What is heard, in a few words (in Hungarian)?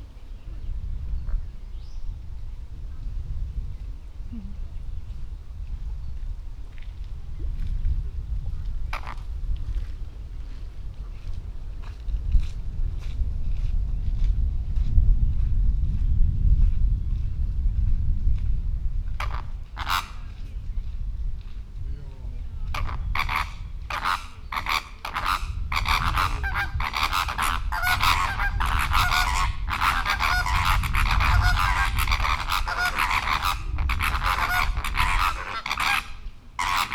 hangoskodnak szél, rózsás flamingó chilei flamingó; allathangok; veszpremizoo 2013